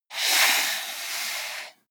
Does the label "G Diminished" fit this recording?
No